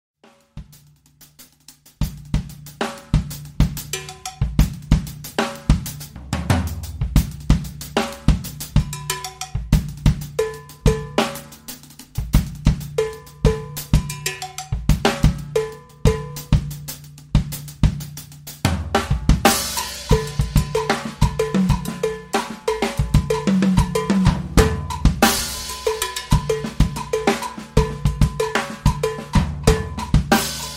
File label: Timba Beat